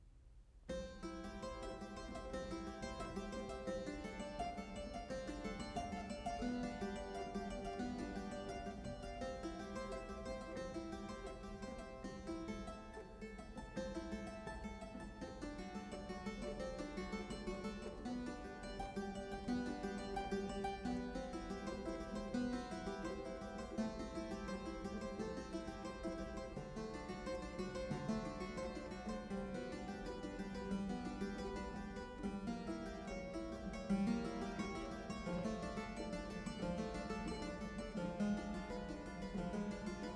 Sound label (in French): Prélude No. 1 en Do Majeur; The Well-Tempered Clavier, Livre 1; BWV 846; Bach; Anna Magdalena Bach Notebook